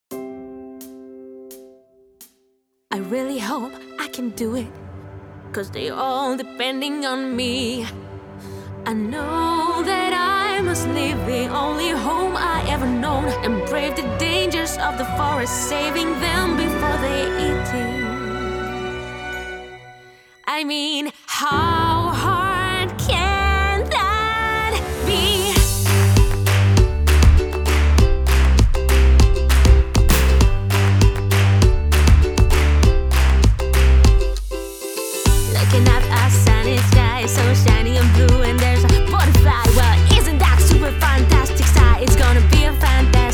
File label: backing track